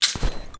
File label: frag pull pin; exp frag